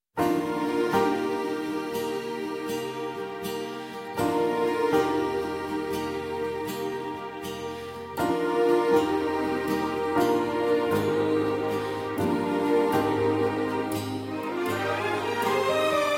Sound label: Sad Love Ringtone; 2009 Tamil Hit Ringtones